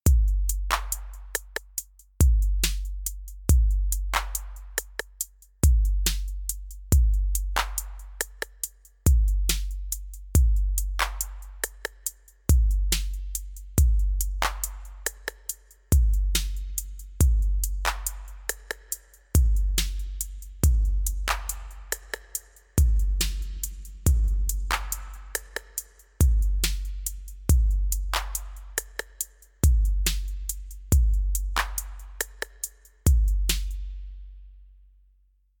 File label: drums; schroederverb v8